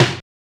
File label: R-50 DRUMZ